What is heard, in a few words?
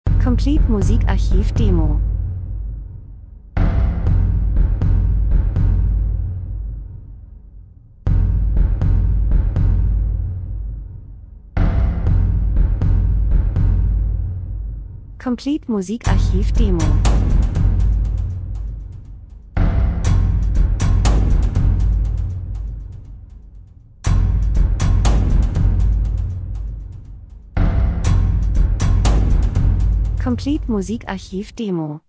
drums; Angst, Triller, Höhepunkt, Spannung, Krimi, Dunkelheit, Maschine, Industrie, Film, TV, Pauken